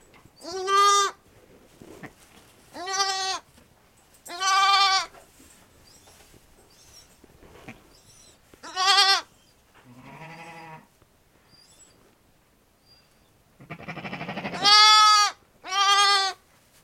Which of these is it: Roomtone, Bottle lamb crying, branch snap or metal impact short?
Bottle lamb crying